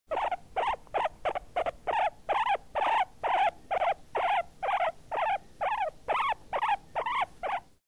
cobaya

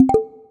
saltar la cuerda cuerda

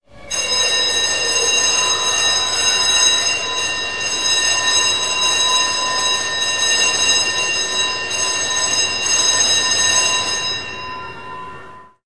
timbre colegio escolar

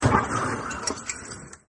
industriales

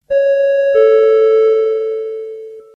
ding dong aeropuerto

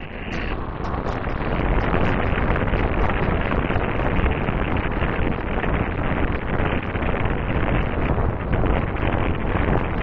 granulados

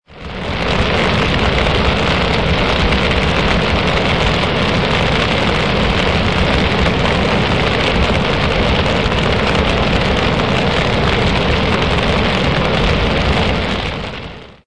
Incendios forestales

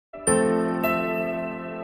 notificacion; interestellar

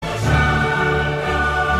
tono notificacion; uefa cl

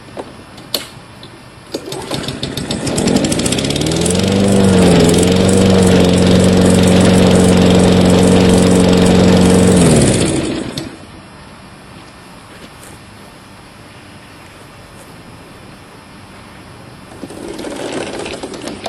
motor

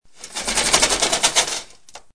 abrir persiana

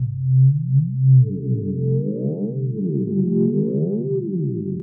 llamada

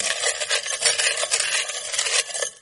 cajas

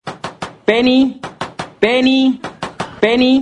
toc toc; sheldon; penny

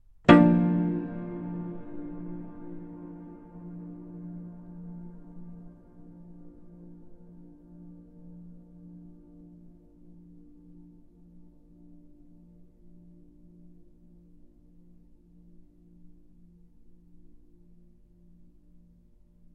acusticos

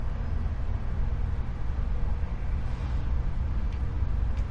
la ciudad el ruido

